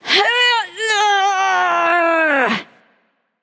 grunt lift; smite; skadi

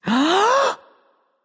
nv breath; smite; awilix

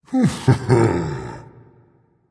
content, voice; Smite; ymir skin6

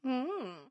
defeat; smite; Apollo

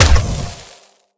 hit; smite; olorun

